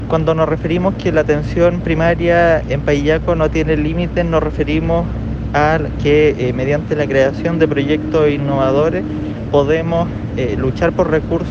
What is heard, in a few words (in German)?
Handschuh